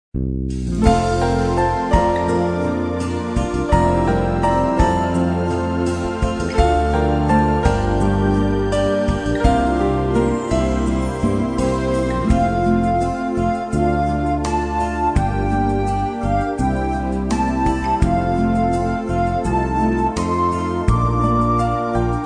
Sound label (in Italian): Auld lang syne con melodia